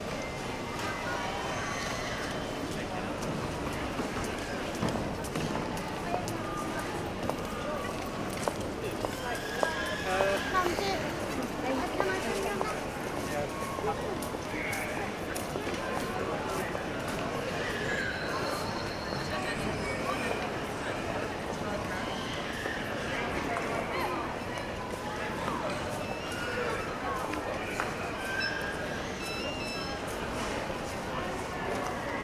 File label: ambience airport stansted check in; sound effects one